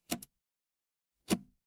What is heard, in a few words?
mechanical switch